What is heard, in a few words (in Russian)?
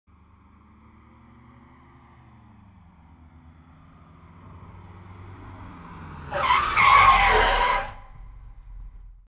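резкий тормоз автомобиля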